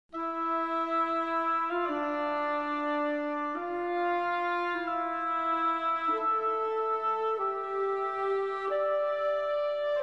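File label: melody; Myst III Exile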